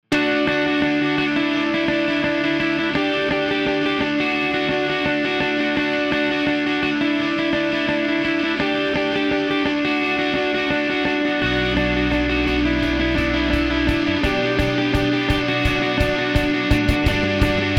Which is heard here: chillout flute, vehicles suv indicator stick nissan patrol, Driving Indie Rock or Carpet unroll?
Driving Indie Rock